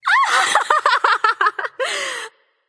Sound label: laugh; smite; persephone